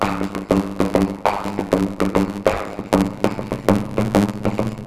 Nu-Indo Loop; Zero-G; Soundclash Nu Dance Electro; Acidized Wavs; FX Atmospheres

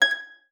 STOP G6, harp; Xsample VOL-8; HARP+FX

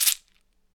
SHEKE, Afro Shakers, Shekeres

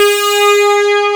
AN SYN, SYN & LEAD; SAMPLE WAVE MIXING 2

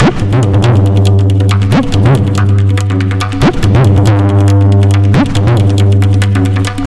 Tabla, 140 BPM; Zero-G; Pure Tabla

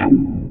HOLLOW PERC, JV 1080; Pro-Rec - Synthsonic

